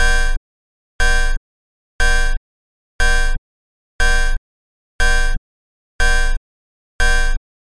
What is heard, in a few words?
Science Fiction Alarms Sound; اخطار تخیلی